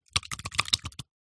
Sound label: cartoon slime gloop wobble movement